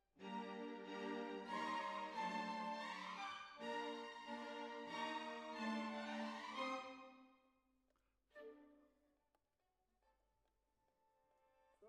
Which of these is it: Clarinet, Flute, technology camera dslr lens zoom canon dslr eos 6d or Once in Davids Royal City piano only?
Clarinet